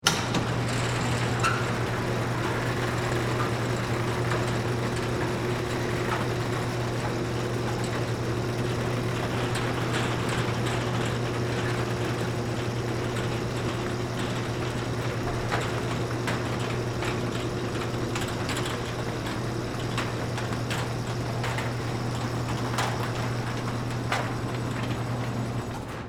Cargo Door Open, Industry, Cargo, Door, Open, Automatic; Garage Doors